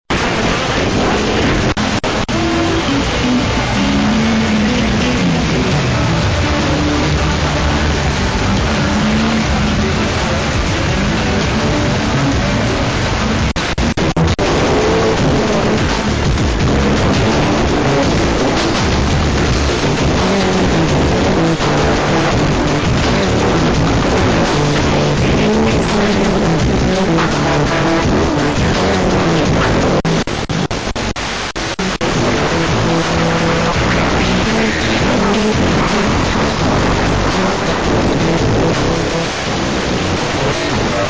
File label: 85 110 MHz